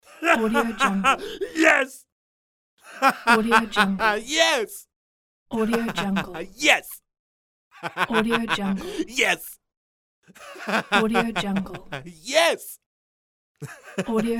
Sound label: male voice laugh yes